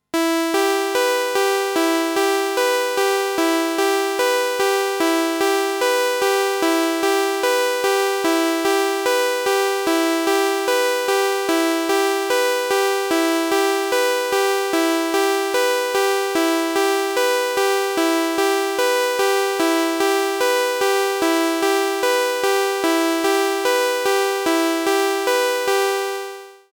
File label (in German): Juno 60 Sägezahn